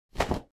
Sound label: Clothes inventory